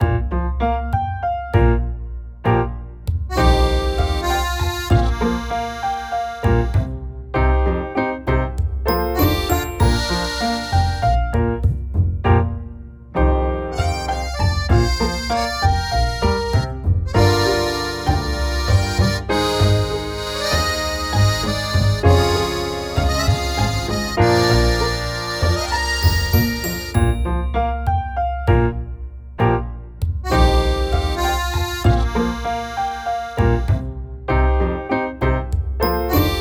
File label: Puzzle BGM; Juufuutei Raden's Guide for Pixel Museum